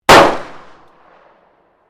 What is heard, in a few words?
PISTOL TANFOGLIO 38 SUPER FIRING SINGLE; Handguns, non military; Pistols (Sound Effects:Guns)